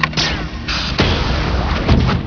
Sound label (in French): porte; StarGate2